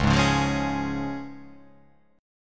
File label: C sharp Major; x,4,6,6,5,3,3,5